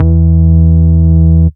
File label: Root E, Bass; Vengeance Samples; Vengeance Ultimate Bass